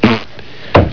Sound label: fart